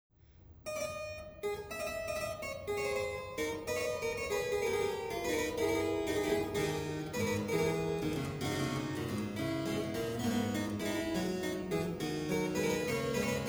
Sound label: gigue; dela guerre